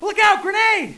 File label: grenade; Counter-Strike 1.6; cstrike; hostage; hgrenade